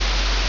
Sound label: ambience room tone